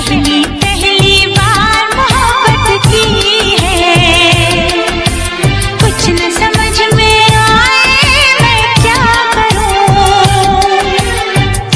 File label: Old Ringtone